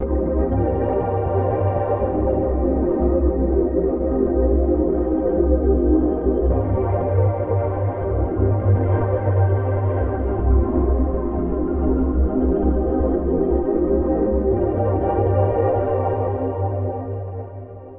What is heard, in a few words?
Quartet Chorus Ensemble Grain; Reason Studios Reason 11